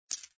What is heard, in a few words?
chip